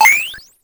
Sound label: randomize, 8 bits; Game Sound Solutions - 8 bits Elements; broberry